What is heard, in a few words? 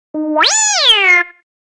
Happy; BB-8